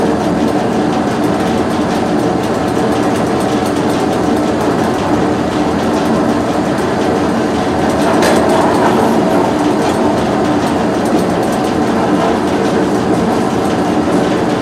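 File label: noisy elevator travel loop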